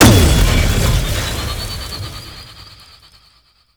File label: Knight Plunge Ability Shockwave; Warframe; Tau; Tau Dax; Abilities